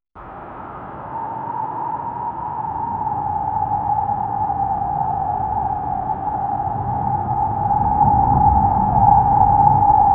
desert ambience; town

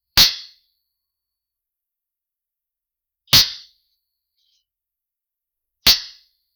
cricket ball thick outsid

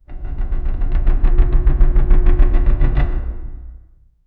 the sharp creak of an; ambience

